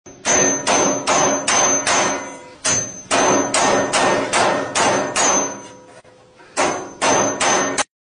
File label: hitting; freddy